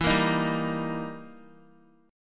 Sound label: E Minor Major 7th Flat 5th, E, down, Guitar; strummed; JGuitar